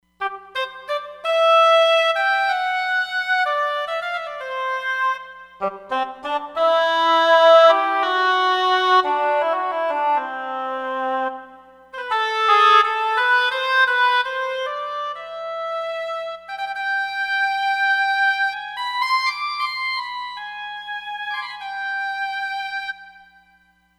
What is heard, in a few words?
Roland Fantom XR